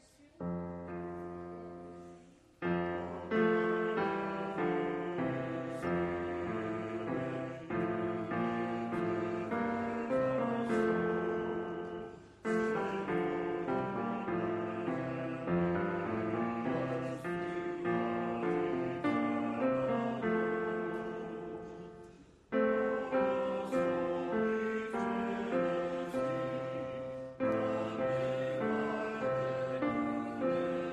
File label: tenor and bass; 566 O God, Almighty Father - vs 3; Gather Hymnal